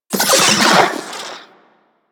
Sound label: Vocal Death; Warframe; Corpus; Mini Moa